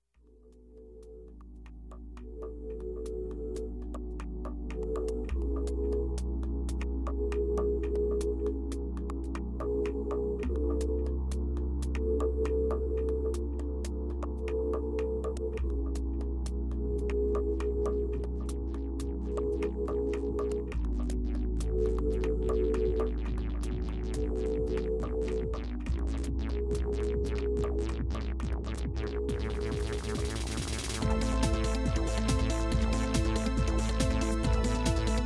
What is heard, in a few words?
Techno Corrida